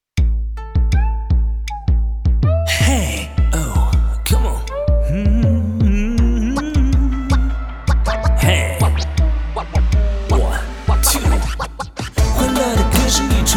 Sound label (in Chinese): 说唱 国潮Rap